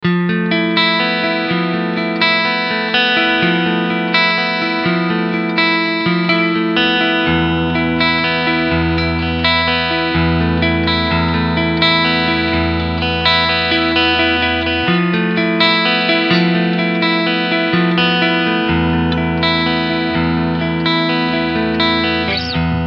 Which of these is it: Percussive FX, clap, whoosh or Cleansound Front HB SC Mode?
Cleansound Front HB SC Mode